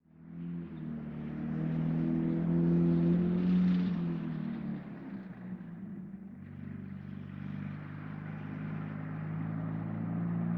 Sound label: hangar tanks passby; War Thunder